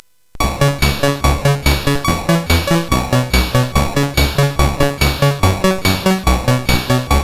Synth Sample III, CSG8580R5; hvsc

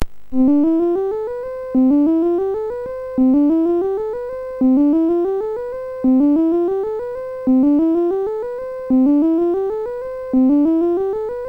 Simple Scale, sid, MOS6581R4

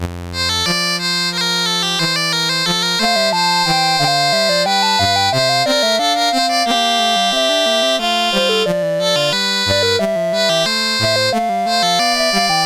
Three Part Invention in F, CSG8580R5; Dave Marquis

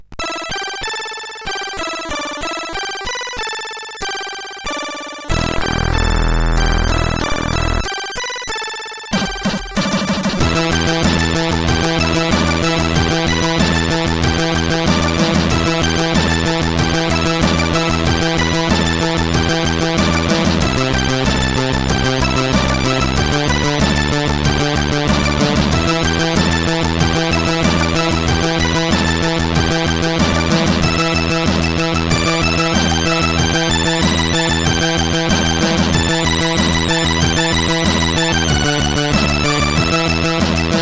Japanese Funk, sid CSG8580R5

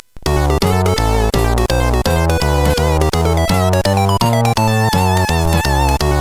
JAZZ, sid CSG8580R5

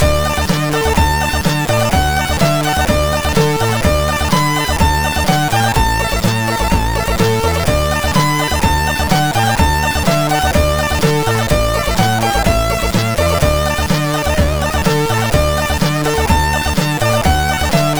TV Tunes Mix, MOS6581R4

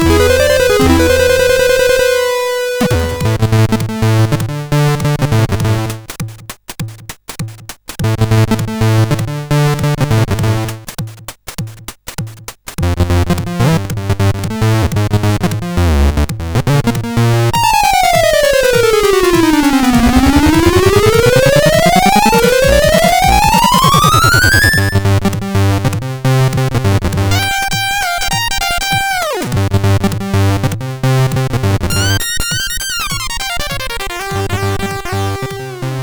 tune, CSG8580R5

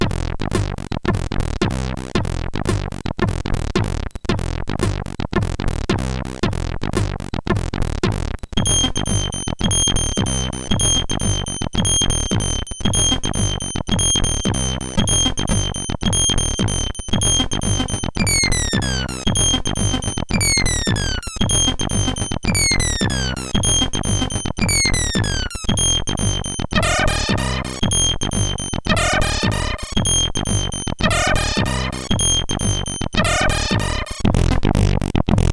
Techno Groove; CSG8580R5; sid; hvsc

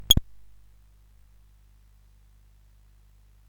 sid CSG8580R5, GAMES; Classic Concentration

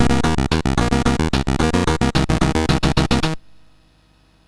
Moon Hop, sid, MOS6581R3

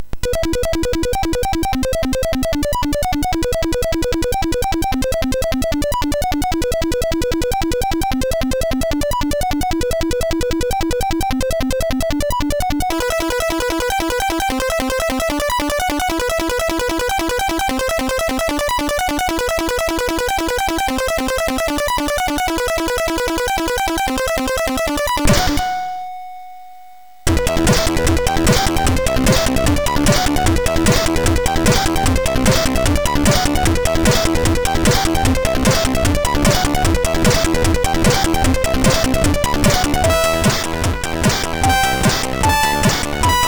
Raindrops, MOS6581R4

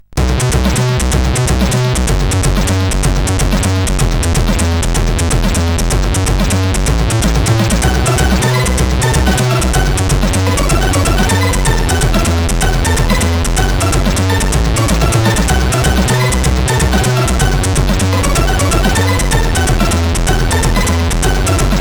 Scrollie Times tune, CSG8580R5; Sudden Death

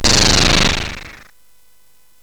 SFX; Narco Police; hvsc

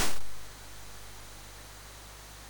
sid MOS6581R2, GAMES; Face Off

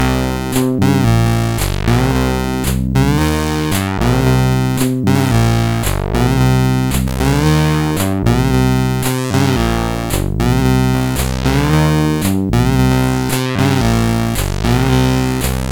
sid MOS6581R4; Empire

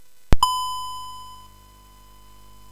Blob, MOS6581R4; hvsc

